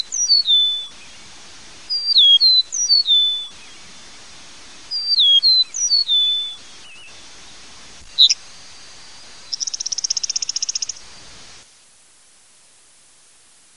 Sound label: Eastern Meadowlark